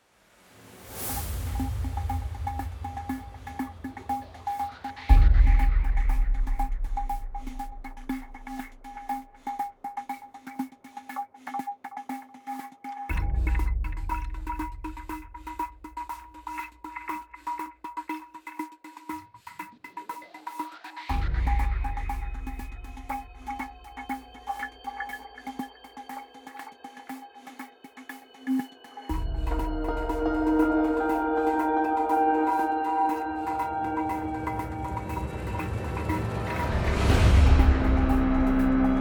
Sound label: Stream Underscore, Percussive Motion